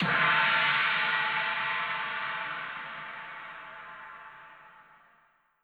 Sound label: cymbal hit like a gong high